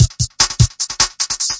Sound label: 150 bpm tinny breakbeat; 141 to 160 bpm